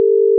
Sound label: Ringback 400Hz+450Hz; Microsoft Lumia-550